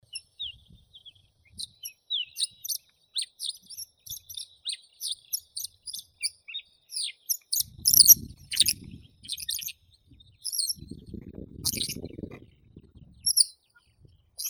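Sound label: Mongolian lark melanocorypha mongolica; zvuki prirodi; zhavoronok mongolskii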